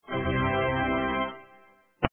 tada

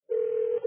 Windows XP Ringout

